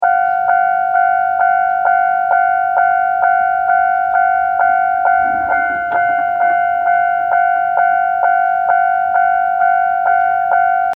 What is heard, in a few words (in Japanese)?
踏切